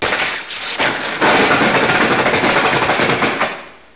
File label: zap, debris